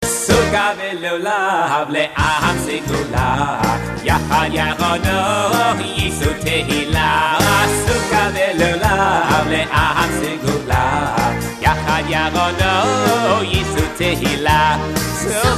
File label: niggun; chagim; succos